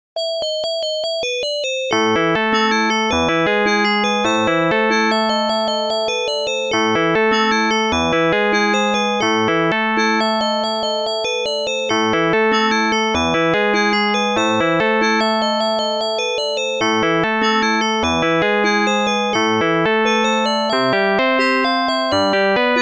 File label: Fur elise